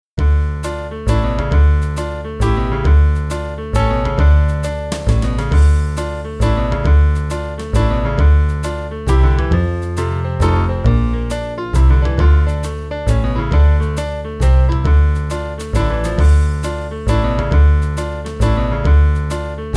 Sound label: Waltzing Time Playback